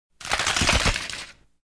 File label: bone crunch; lego